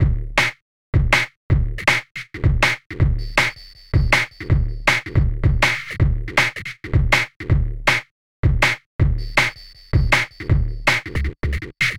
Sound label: DRUMLOOP D+B 160; eJay